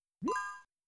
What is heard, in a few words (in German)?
spieler nimmt diamant; jazz jackrabbit 2